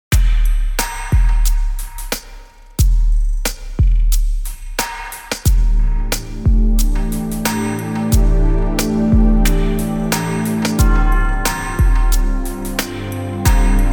疑惑シーン BGM

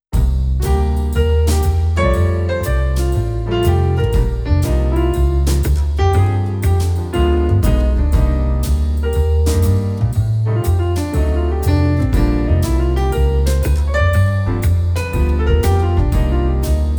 ジャズ BGM

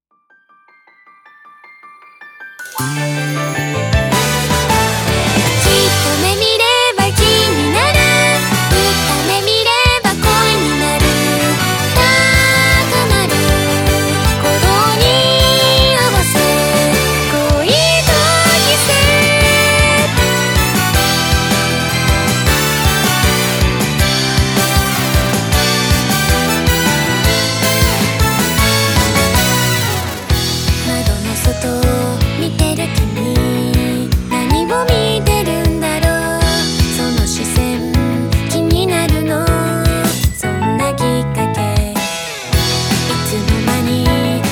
アップテンポ 歌, 王道アニソン風ガールズポップ; 切ない

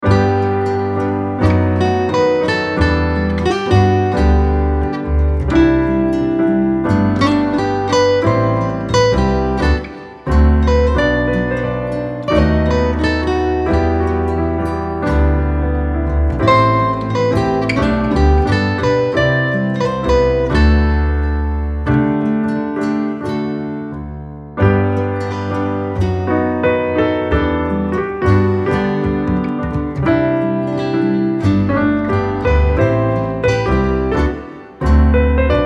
日常シーン BGM

